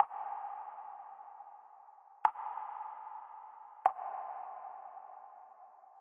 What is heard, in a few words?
Spooky Water Drops; server-nalc; ambience modpack